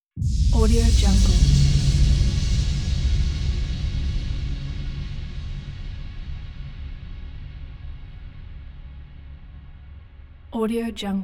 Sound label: suspenseful low impact with bright tail; hollywoodedge